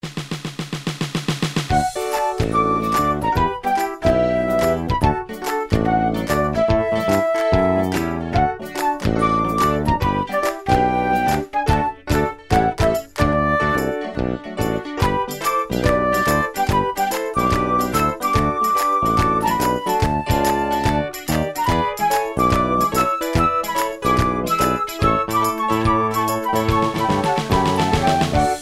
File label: Neighborhood Band Happy